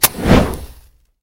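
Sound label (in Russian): внезапно вспыхнувший огонь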